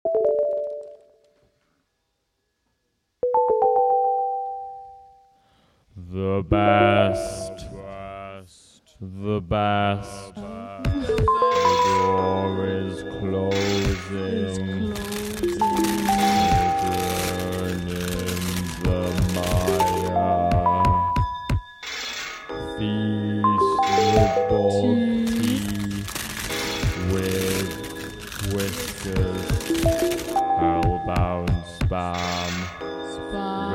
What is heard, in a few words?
Goblin Song